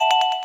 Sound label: ready, kettle; cooking; Votive 1.21.1; Votive Content